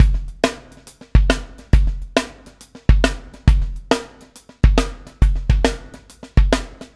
PEAK, Backbeat

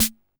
Roland TR-55 Snare; Drums

